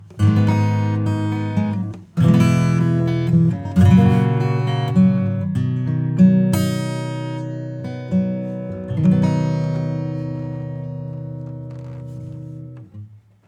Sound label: EQ acoustic 2band Dynamic; Kirchhoff